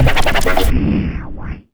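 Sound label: SCRACH, scratch; E-Lab; X Static Goldmine 1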